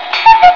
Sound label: cuckoo